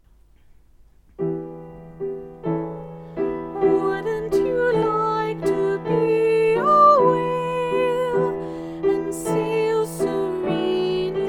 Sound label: Alto Line